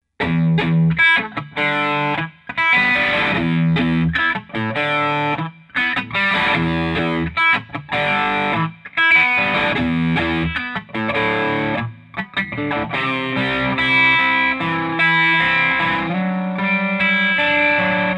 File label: back fender dist